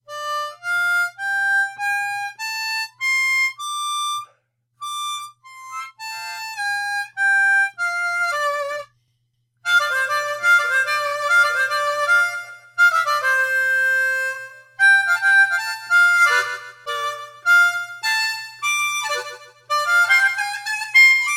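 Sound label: 3rd Position Blues Scale, harmonica; funk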